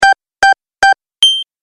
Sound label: Race Countdown Beeps Fast; Beep Sound Effects